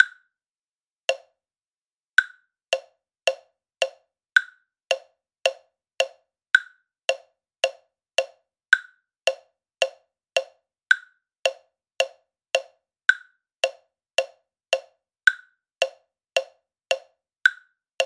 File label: Click Study Accented Bounce Around, drum kit